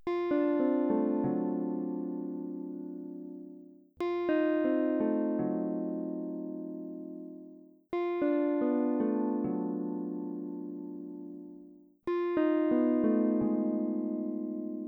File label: f13b9 clusters